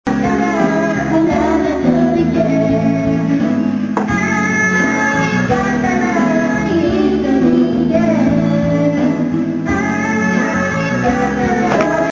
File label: karaoke